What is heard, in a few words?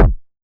Heavy Synth Kick; Zero-G; Soundclash